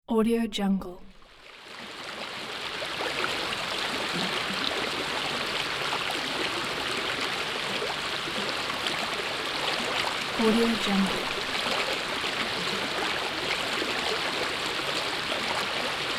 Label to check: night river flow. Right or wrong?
Right